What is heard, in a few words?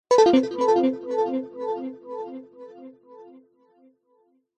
multimedia game sound nature game negative